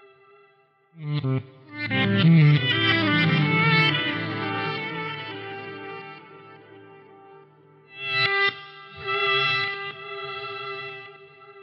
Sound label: E Guit Solo, сэмпл гитары эмбиент; E Guitar Solo